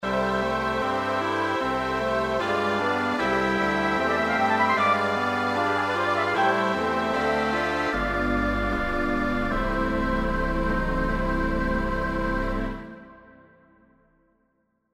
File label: MIDI; orchestration